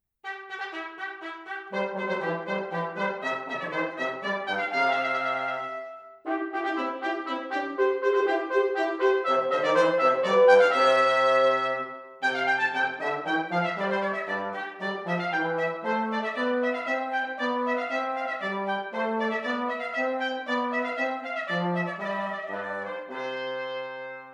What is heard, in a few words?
Fanfare; Purcell